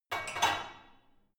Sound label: barbell only replace, fitnessstudio